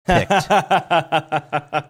chuckling

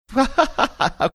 funny laugh

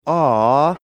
awww

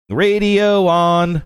singing radio on

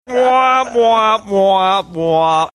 singing wah wah wah wah

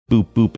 boop boop

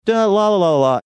singing short little ditty

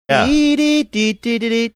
imitating sound of white throated sparrow

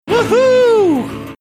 whoo hoo

